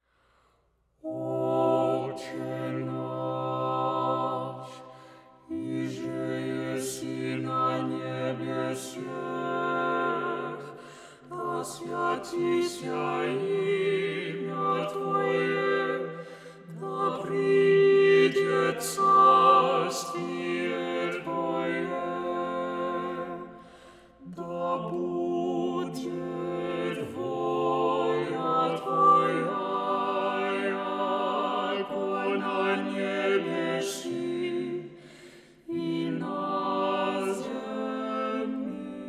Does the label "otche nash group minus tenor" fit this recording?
Yes